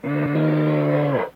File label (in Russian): slitnij hishnij ryov medvedya